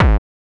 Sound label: BD KICK FX